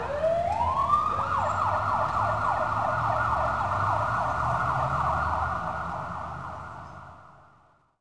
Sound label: siren; langeles